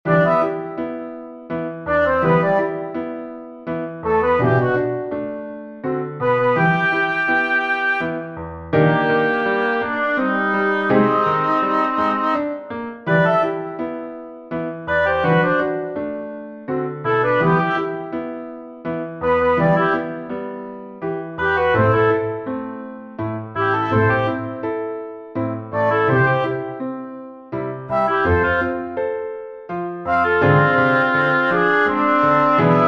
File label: pno